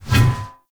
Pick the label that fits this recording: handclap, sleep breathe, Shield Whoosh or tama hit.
Shield Whoosh